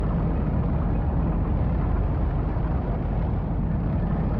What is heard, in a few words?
tank Move Heavy; movement; Mindustry